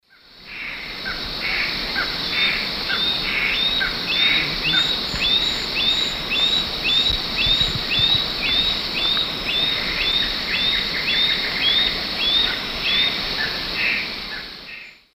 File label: Notharchus tectus, subsong; S. America; Eastern Lowlands